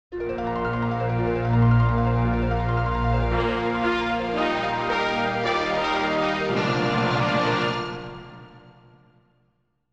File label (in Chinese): 片头音乐